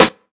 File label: studio snare b key